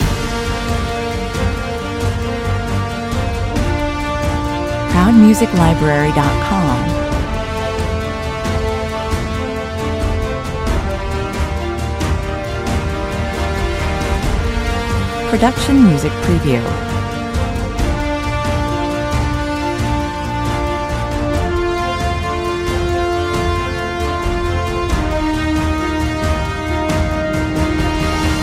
A smooth string section opens the track and builds to strong percussion and staccato string ostinato